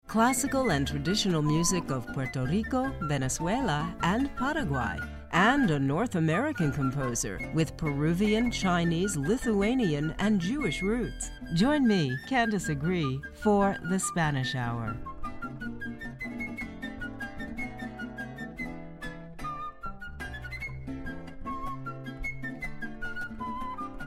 music from paraguay colombia venezuela and puerto rico; latin american walkabout saturday